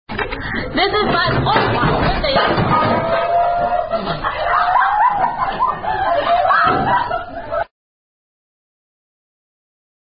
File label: Hand Clapping Rhyme; Spanish Apples On A Stick